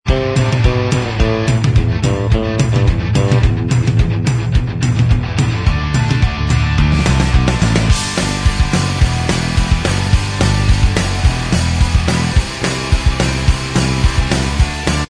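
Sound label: instrumental track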